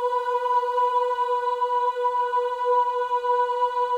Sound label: CHOIR OHS; Spectrasonics; Symphony of Voices